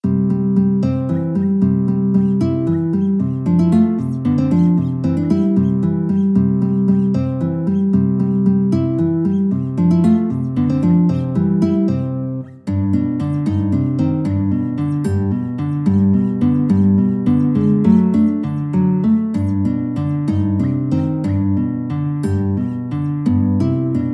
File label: country, guitar; hunten